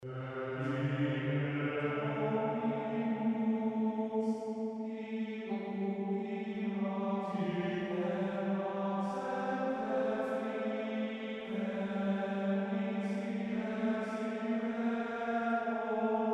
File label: chant test